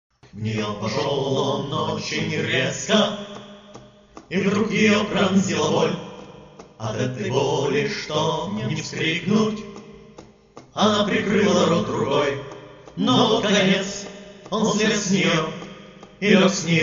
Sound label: devchonka choir